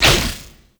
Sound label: Necramech Melee Thrust; Enemies; Warframe